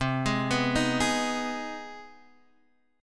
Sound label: C Minor Major 7th Sharp 5th, Guitar, Half Step Down